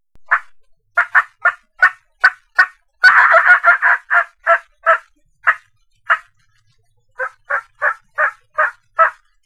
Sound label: Turkey Cackle; ORION